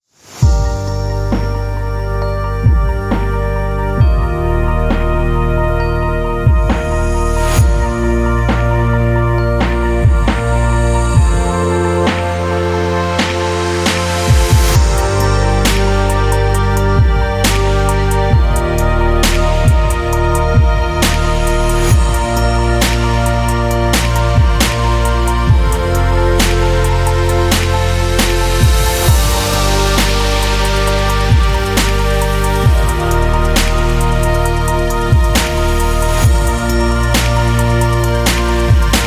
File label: Atmospheric Electronic; atmosphere music